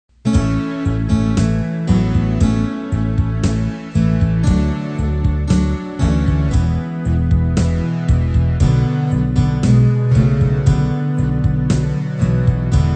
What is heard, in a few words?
bass